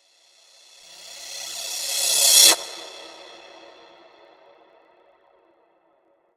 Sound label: Reverse Crash; Disco House